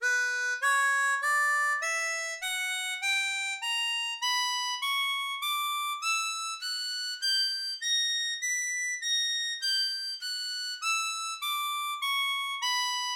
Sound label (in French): Si B Mineur, Gammes, harmonica